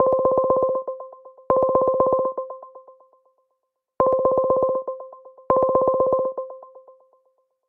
Hangouts Outgoing

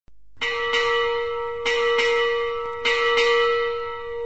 Marine Boat Bell

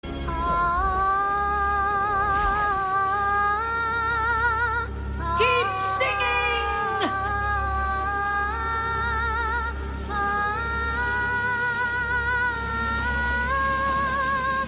singing

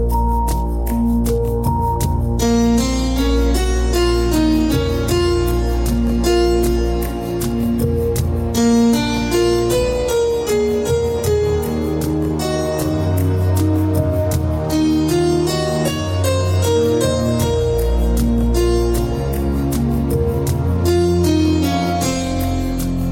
Romance

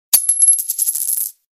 Coins Sms

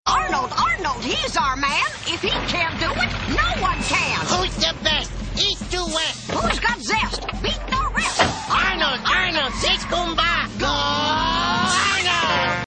Cheer

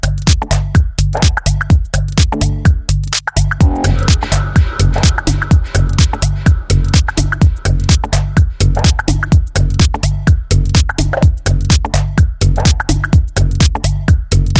Pulse

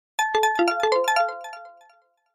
Short Alert

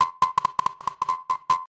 Electronic Bounce

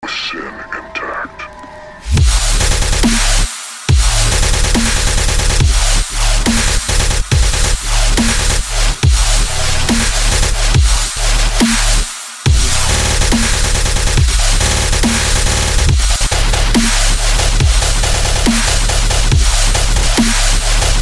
ringtone

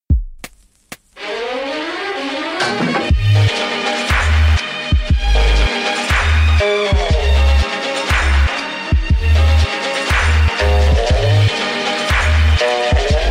Turkish Mix

